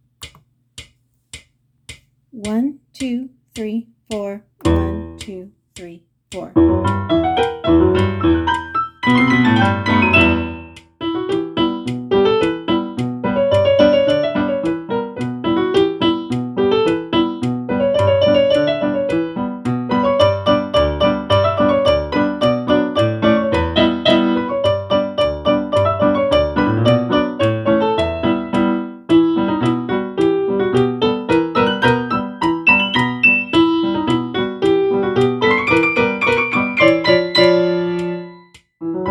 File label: Duet; Angry Birds